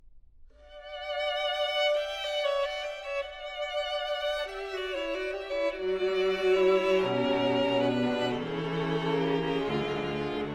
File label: Quintet in B Minor for Clarinet and Strings - Mvt. 1. Allegro; Brahms Trio & Quintet